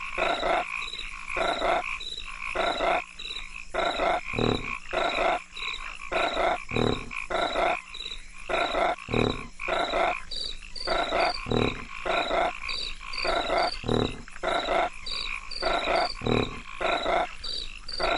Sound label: frogs and crickets